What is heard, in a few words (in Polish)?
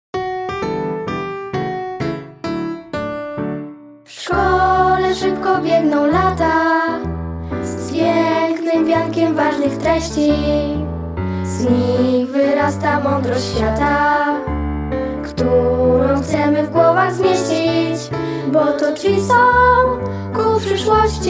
hymn szkola; szkola tuchomie